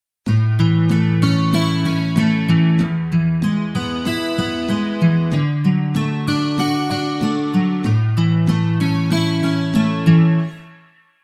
up and down arpeggiato fingerpicking, electric guitar